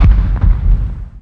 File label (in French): Explosion